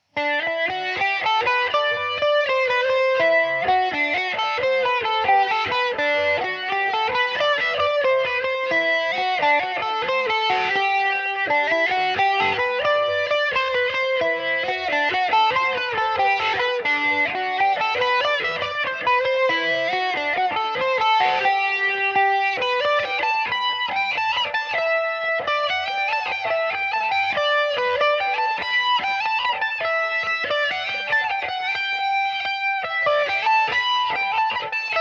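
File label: jig G major mandobird, irish mandolin; The Inncocents’ Jig; Epiphone Mandobird VIII electric mandolin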